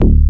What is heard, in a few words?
Synth Bass, Roland